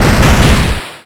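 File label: Battle Boss skill; Etrian Odyssey III HD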